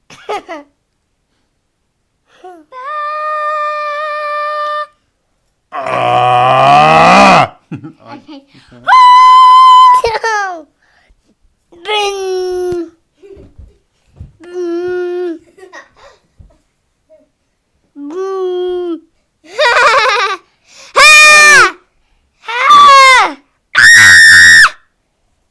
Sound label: laughing